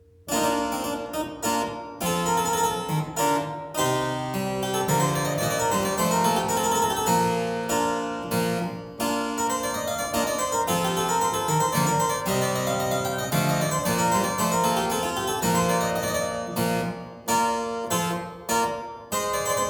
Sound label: Galliard, harpsichord; Byrd; BK100b [EKM10b]